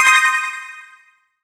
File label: C5, Synth Leads